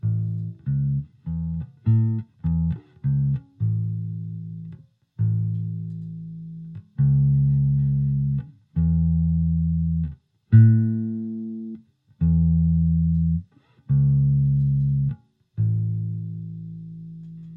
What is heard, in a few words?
A Major Arpeggio, guitar